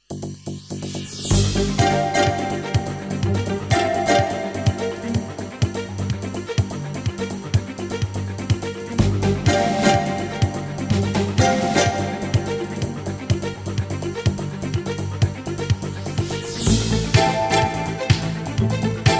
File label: jingles; 90 DDD Jingles